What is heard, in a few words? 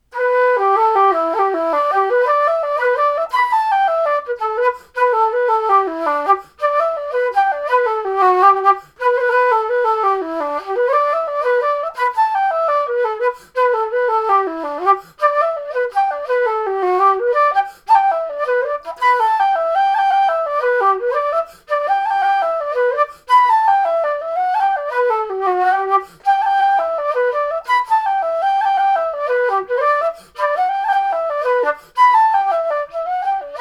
flute; Willie Coleman's; Jigs